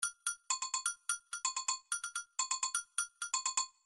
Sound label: individual drums agogos, midi